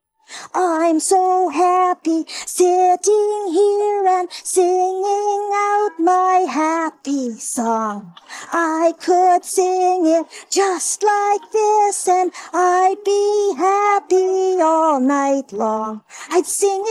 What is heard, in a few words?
im so happy vocals